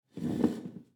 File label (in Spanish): Silla